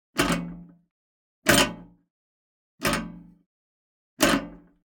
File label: Generic Button Metal Medium; boom library; The Interface